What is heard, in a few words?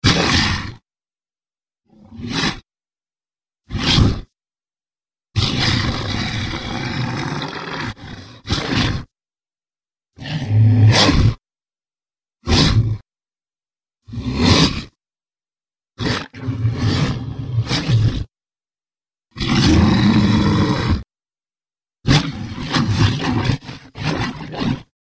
Monster Attacks, monsters